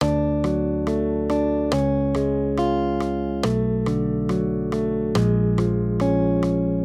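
Accompaniment, Major Triads, D-Db, 140 BPM, guitar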